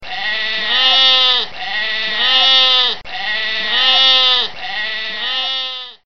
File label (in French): mouton